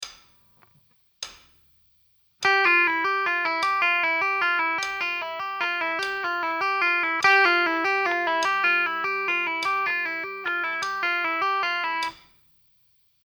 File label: gitar